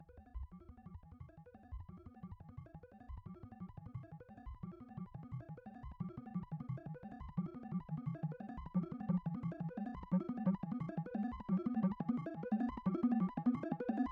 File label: 175bpm arpe